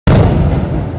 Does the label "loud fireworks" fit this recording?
Yes